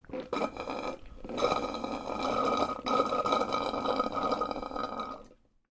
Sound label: Person drinking through straw slurp